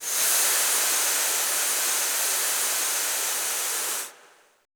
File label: VOICELESS, Choir; Best Service; Extended Classical Choir